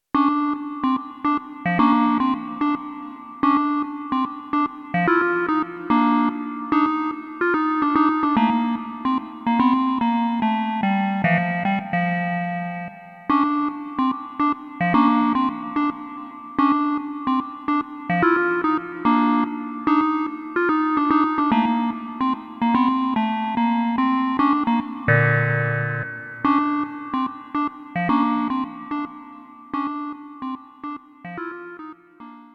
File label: organ